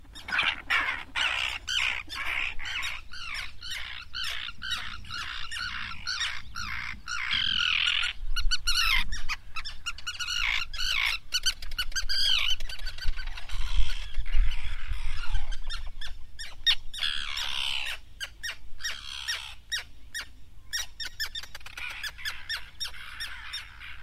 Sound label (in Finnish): kalatiira